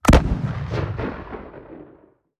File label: Bang; Nightstalker